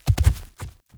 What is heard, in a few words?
Wolf Steps Land Default; Animals